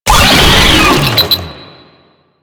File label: Infested Player Spotted; Enemies; Vocals; Warframe; Proto Infested; Brachiolyst